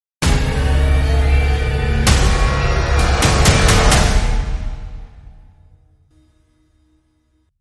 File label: Crime Sting, Short